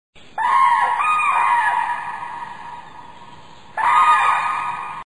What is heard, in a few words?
Common Crane, songs